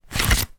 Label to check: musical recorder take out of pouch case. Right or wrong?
Right